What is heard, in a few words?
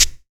SHAKE; E-Lab; X Static Goldmine 2; akai